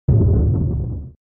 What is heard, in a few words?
Bomb